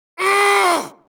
mohole dfa hit effort

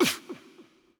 player lighthurt

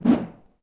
Swoosh Weapon; Civ4 beyond sword

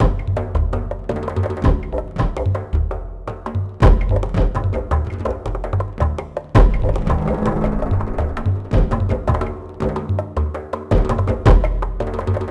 Goody Drums; Ambient; Civ4 warlords

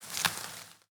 pick plant; Rust

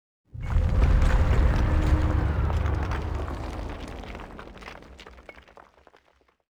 mohole emerge

